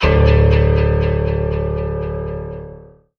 dead ringer, Piano

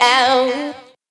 "ow" SUNG

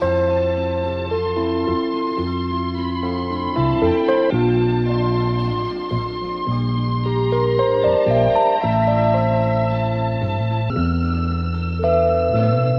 keys

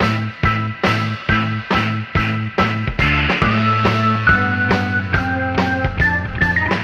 keys and guitar, Phonky loops

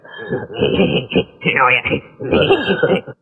laugh oh yeah; Voice; ooh ahh; BEAVIS

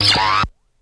jawaaah, zaps and beeps

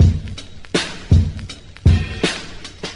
dustalot, Drum loops Boombap

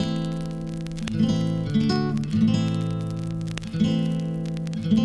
guitar, Jazzmatazz loops

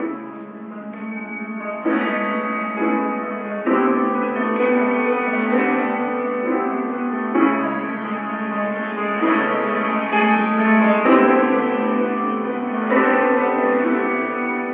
piano distant, Loops Sad

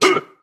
"hoo", voice; ooh ahh

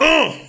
uh; ooh ahh

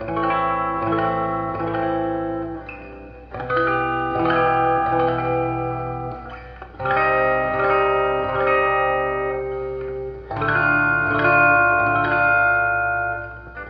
guitar, Loops Sad

